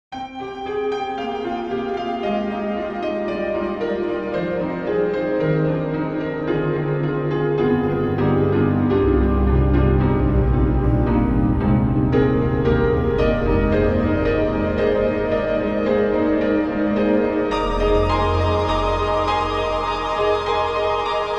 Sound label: Granular Piano; Steinberg HALion 5; Auron